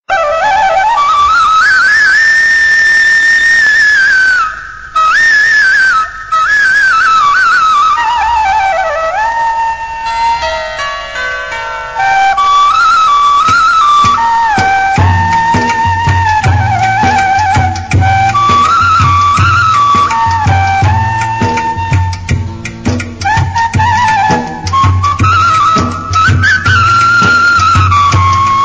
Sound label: tamil devotional instrumental